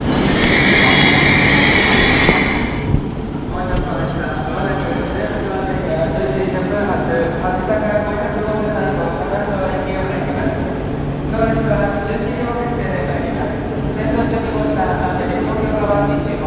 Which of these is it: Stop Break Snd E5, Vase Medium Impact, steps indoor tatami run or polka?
Stop Break Snd E5